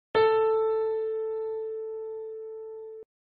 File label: a piano note; a4